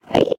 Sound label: idle, endermen; ayuncraft-1.5